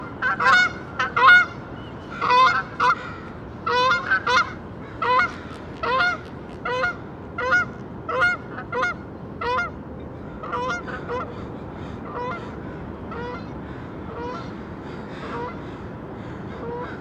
Canada Goose Branta canadensis; waterfowl

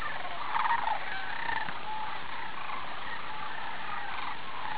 sandhill kearney

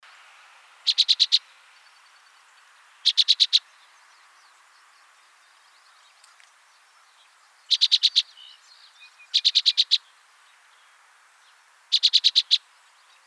Orchard Oriole, Icterus spurius; blackbirds orioles

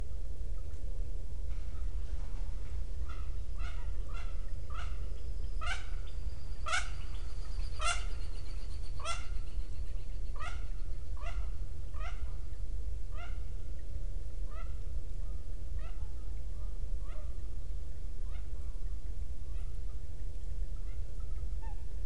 Mandarin Duck Aix galericulata; waterfowl

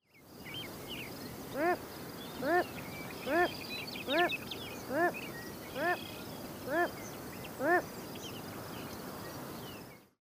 Wilsons Phalarope, Phalaropus tricolor; shorebirds rails

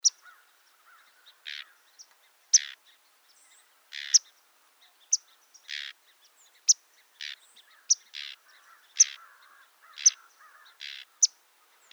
Sedge Wren, Cistothorus stellaris

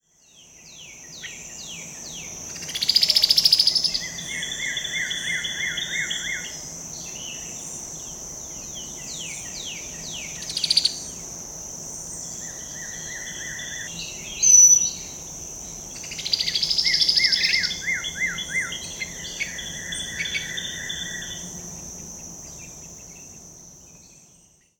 Brown-headed Cowbird, Molothrus ater ater; blackbirds orioles

